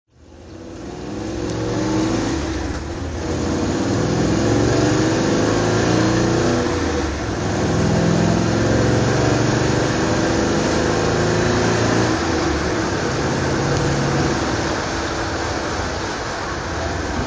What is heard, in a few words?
MGB GT V8 drive